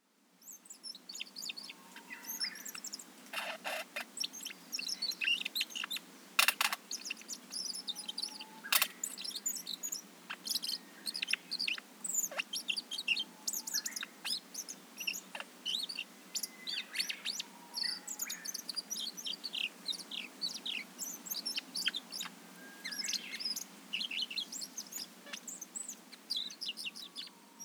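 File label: Siberian Blue Robin; koe; nagasaki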